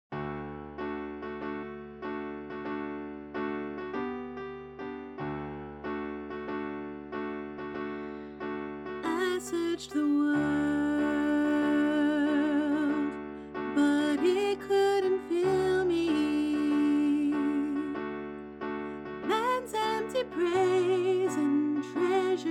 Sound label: Soprano; Practice Recordings; Octavos